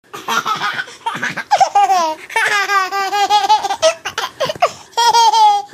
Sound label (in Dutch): Lachende baby